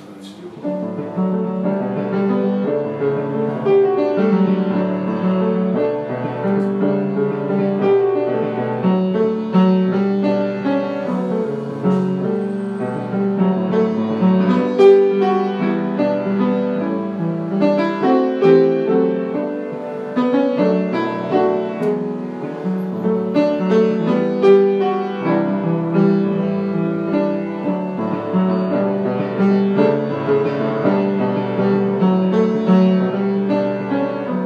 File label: piano melody